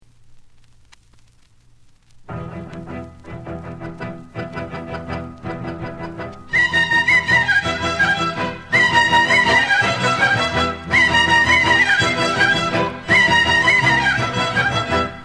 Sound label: Rustemul